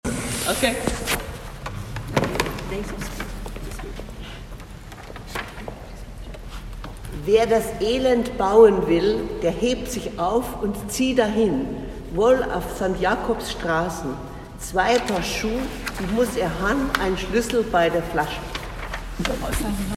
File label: Canto ultreia bass words; citysings